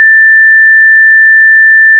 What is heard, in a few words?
approaching pitch shift